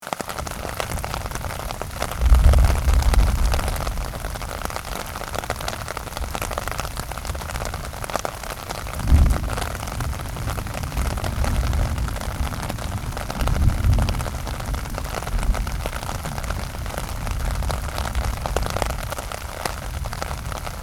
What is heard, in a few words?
Rain on umbrella with thunder loopable